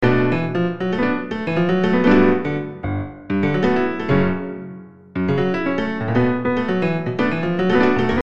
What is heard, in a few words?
piano; easyrpg; WitchsHeart